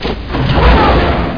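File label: missile launch; Team17; Phoenix Demo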